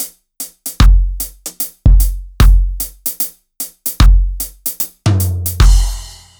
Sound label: Driven Kit, сэмплы ударных регги; Reggae (Регги)